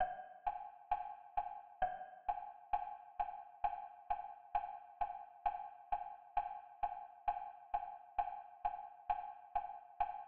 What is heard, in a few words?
click track only